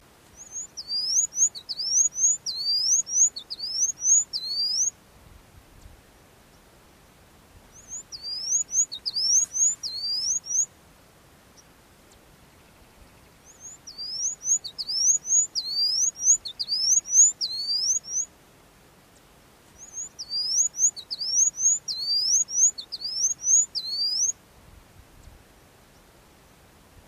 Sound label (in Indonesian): kicau master branjangan call